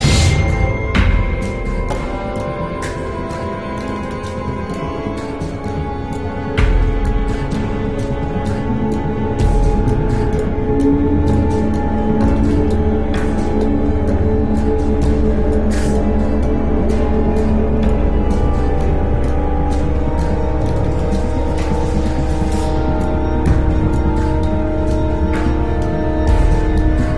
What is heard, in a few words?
Dialog (Loop)